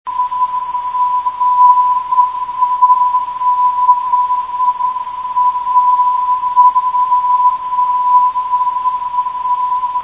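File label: 592 kHz, non-directional beacon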